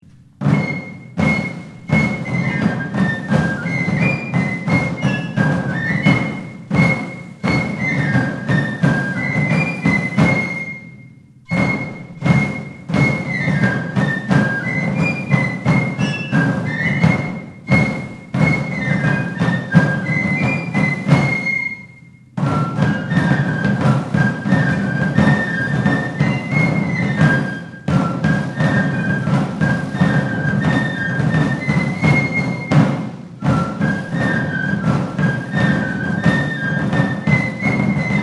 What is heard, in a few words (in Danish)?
Piccolo march